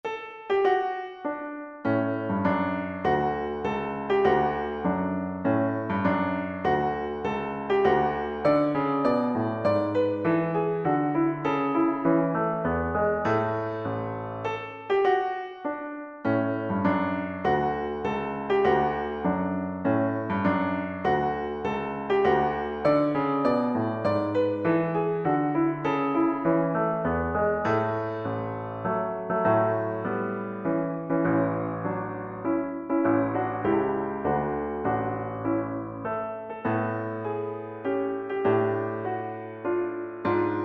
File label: Minuet in D Major, piano; Mozart; K94